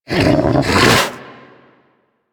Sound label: Snow Critter Vocal Alerted; Warframe; Hunting Critters